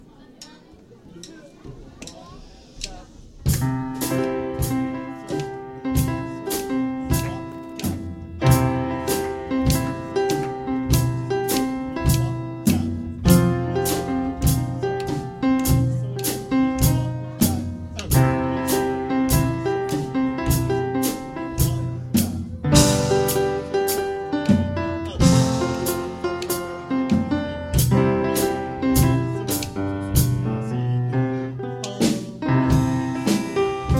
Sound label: Blues